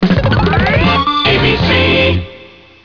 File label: Jingles Sonovox; WABC